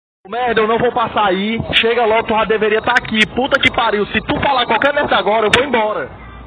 pistola

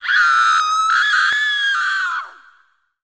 grito de miedo

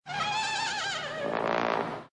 caballo trompeta

